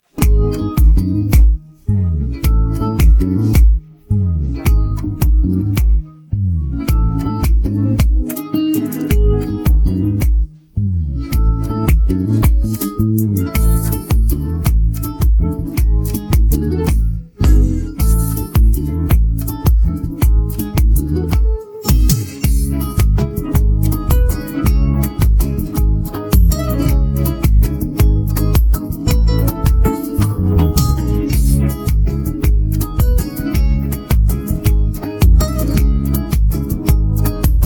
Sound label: Instrumental Version